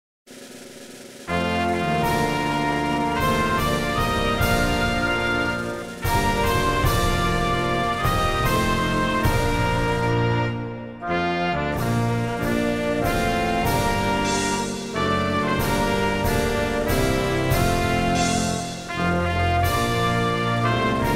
Star Spangled Banner (Bb) orchestrated acc.

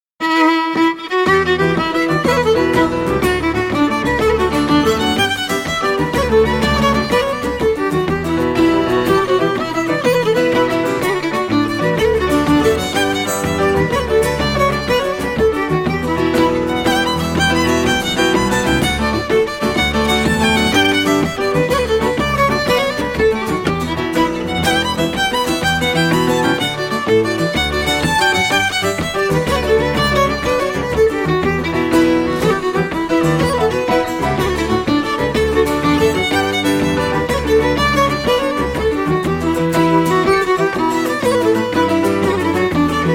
Cape Breton Fiddling

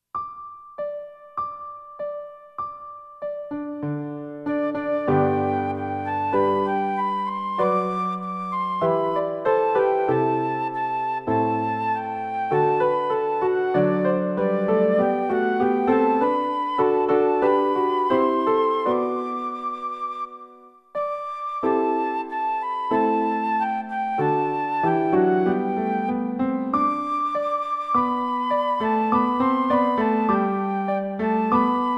G, high, piano acc.; Simple Gifts

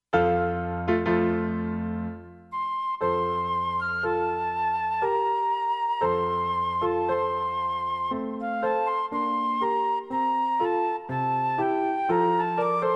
F, high, piano acc.; Ridente la calma

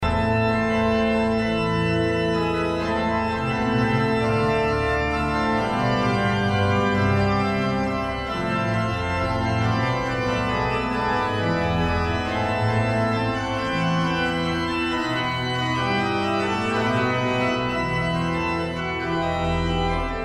Prelude and Fugue in A minor; J. S. Bach

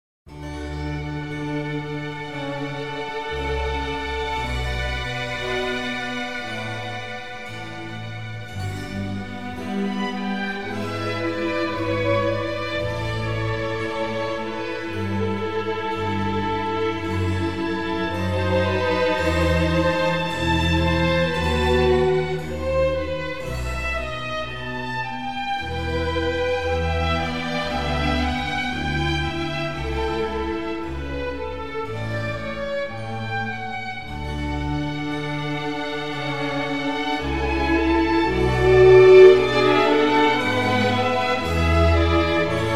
Orchestral Suite, Air; bach

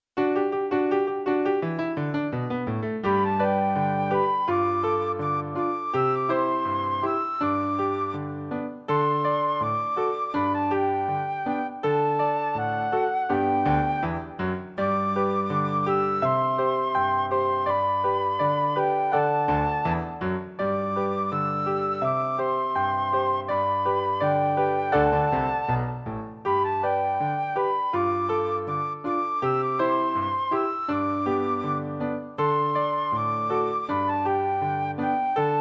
G, high, piano acc.

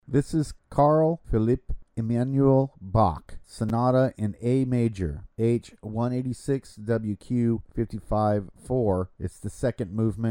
Sonata in A Major second movement; Carl Philipp Emanuel Bach; H 186 Wq 55 4

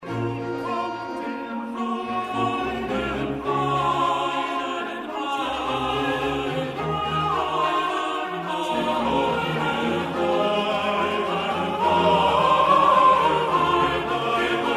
Chorus; J. S. Bach; Nun komn, der Heiden Heiland

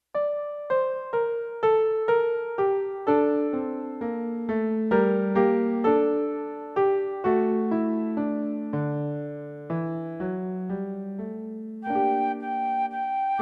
Solemn Love (Gm, high) piano acc.

